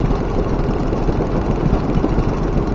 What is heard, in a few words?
pyro operate; block